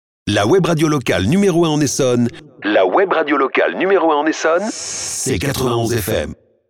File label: Drop Jingle